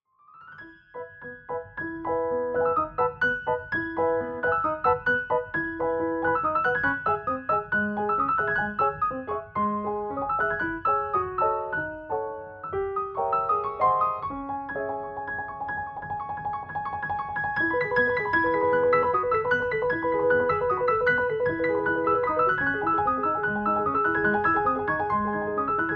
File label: Hungarian Rhapsody in C-sharp minor, piano; liszt